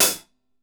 SHORT HIT, Drumworks; Sampleheads - New York City Drumworks VOL-1

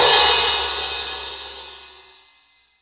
HH OP, Drum Dry; East Collexion; Drum 1 Dry

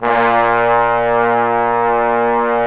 BRS TBNS F, Bone Sec.; Roland - Brass, Strings, Hits and Combos; Bone Sec.3

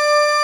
ORGAN WAVE; 128 PRO SYN; SAMPLE WAVE MIXING 1

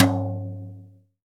TOM, Drum 1 Dry; East Collexion

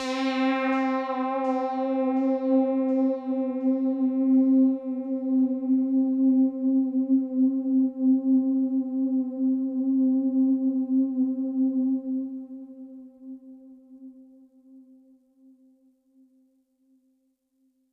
Pad C, Synth Prophet One Shots; Electronisounds

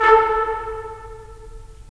BRS, Tps Stacc; Roland - LA Composer vol. 4

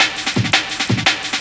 Jungle, Breakbeats

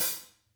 HAT; Sonic Reality - Vintage Timetraveler

